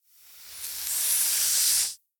snake hiss

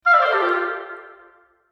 woodwind run down

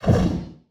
Torch Lighting; SoundsCrate

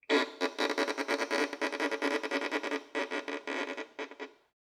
Violin Scratchy Noises

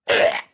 death grunt; SoundsCrate

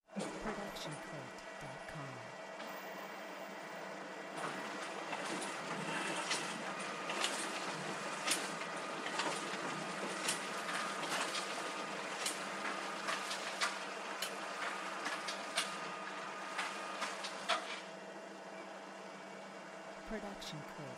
printer printing; soundscrate

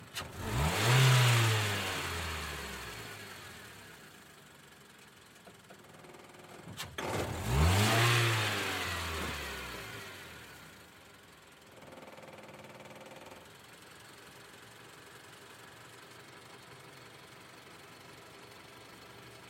car starting

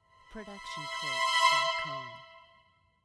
high dissonant cresc

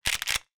Shotgun Reload

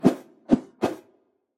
arrow swooshes multiple

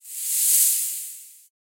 hiss; soundscrate

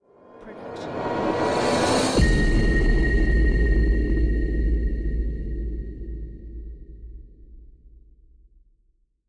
cinematic rise hit; soundscrate

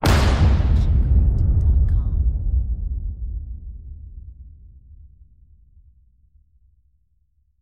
large explosion; soundscrate

